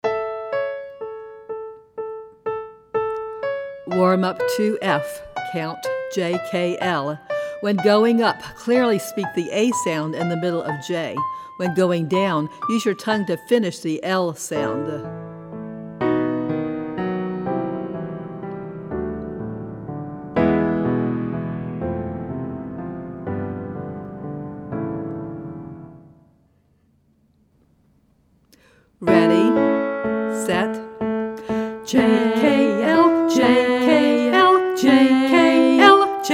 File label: Vocal Warm Up Count